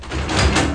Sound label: Rearm; Big bang